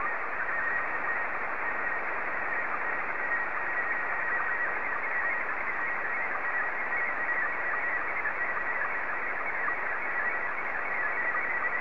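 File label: Scottie 2, slow-scan television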